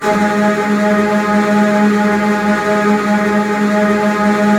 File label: Virtuoso Strings, TRM FX